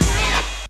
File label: squeaky